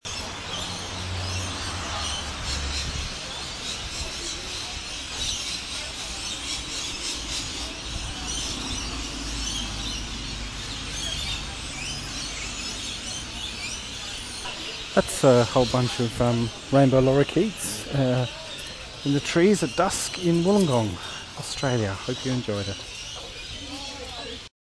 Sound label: chirping birds